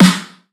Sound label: snare; Slate; SD MIDNIGHT Sample Pack